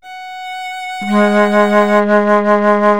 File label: harmonic test signal; Separation of Sound Sources by Convolutive